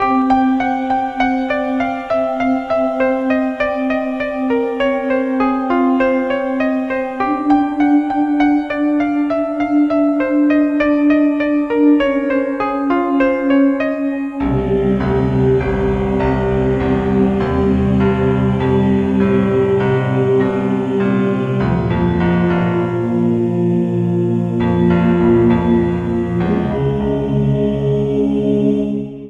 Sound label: bgm comic clear horrible